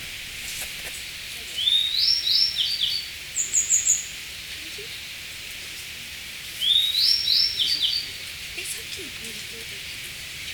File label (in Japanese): kurozi, bird song